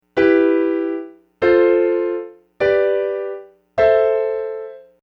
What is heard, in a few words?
E F G A